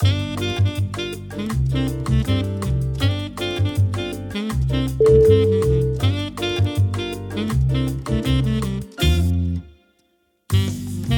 sunshine bossa

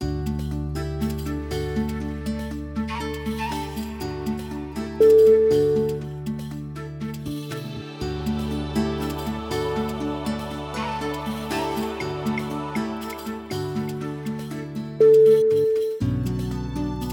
gentle african background

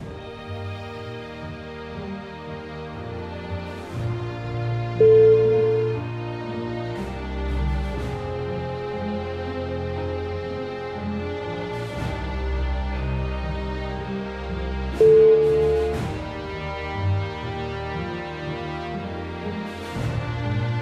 victorious march

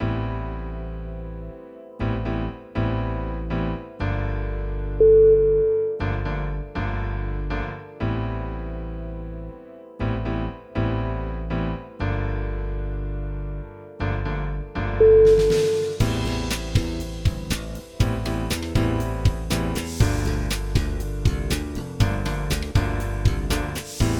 vintage roots

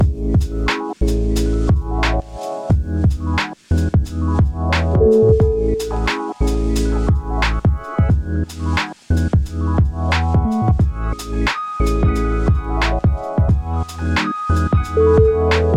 autumn forest

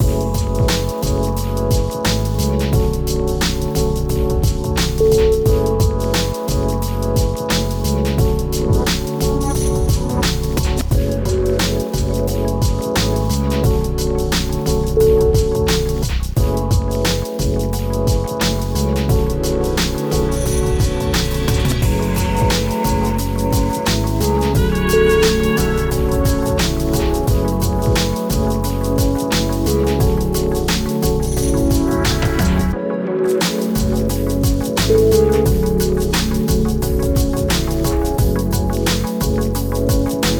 lounge beats

